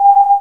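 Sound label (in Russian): кнопка цифровой вибр звонкий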